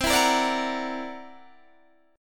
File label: C 9th Flat 5th C down Guitar Open D